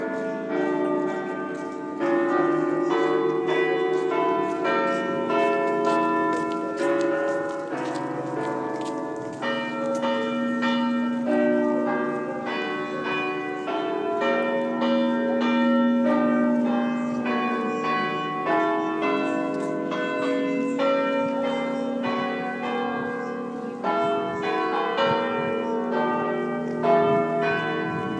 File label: bradford town hall christmas chimes at 1pm